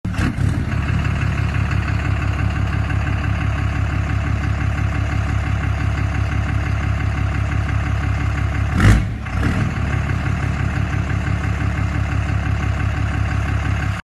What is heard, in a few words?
VALVE SOUND, RAM; RaceME